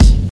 2000s loud bass drum g key, Royality free bass drum single shot tuned to the G note